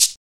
dark tone hi hat f sharp, Royality free pedal hi-hat one shot tuned to the F# note; hat samples